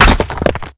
rock fall break softer; Explosions

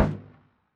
minigun fire end; Weapons; DCS

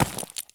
sprint slate; Escape from Tarkov

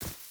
footstep boots grass run; Rust

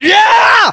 melee attack; english

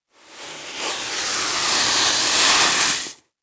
sand shot; World of Tanks Blitz

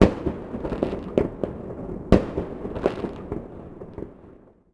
salute; World of Tanks Blitz; hangar 9 may

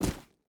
footstep boots gravel jump land; Rust

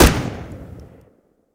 Browning 303 LH Inner End; Weapons; DCS

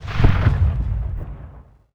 flak gun 1 shot echo

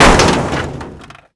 car hood; Call of Duty Modern Warfare; physics

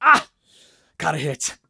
hurt medium; Escape from Tarkov

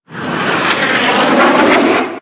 damage hits explosions

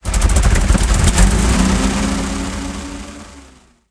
m4a3 engine load, engines tanks; War Thunder

